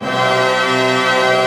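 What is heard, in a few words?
pentatonic F# fortissimo, orchestra; Sound On Sound; Peter Siedleczek's Orchestra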